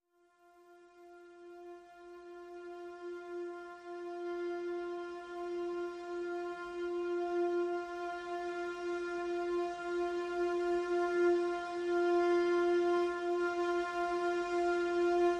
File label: Harmonic Strings Mix